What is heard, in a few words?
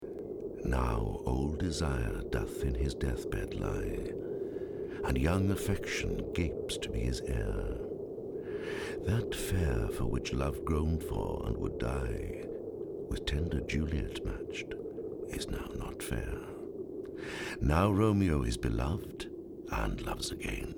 Chorus; Romeo Juliet Act 2